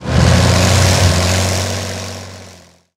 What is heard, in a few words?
BMP Engine Acc Start; DCS